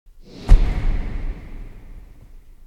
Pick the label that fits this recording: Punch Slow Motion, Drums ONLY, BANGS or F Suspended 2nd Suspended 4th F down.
Punch Slow Motion